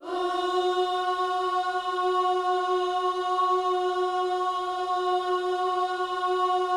OOS F#, Vocal Gospel

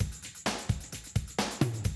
130, Classic DrumLoops

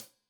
Hat Tight, Hi-Hat, Cymbals; Deeply Sampled Drums

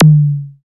FX Analog; Sound & Vision - Gigapack II

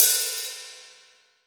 HH AMB, AMBIENCE HH

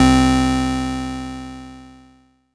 C1, Synth Hits; Zero-G; Soundclash Nu Dance Electro; Acidized

